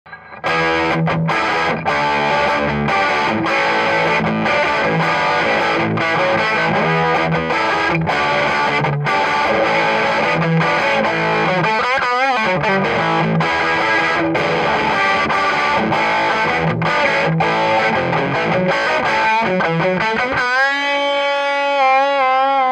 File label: es335 dist